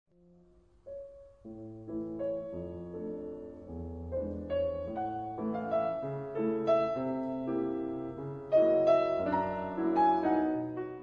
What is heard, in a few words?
piano, 2 pianos